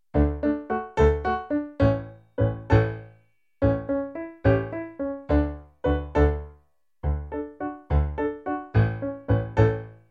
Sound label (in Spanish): Chiapanecas piano; musica I ESO 2015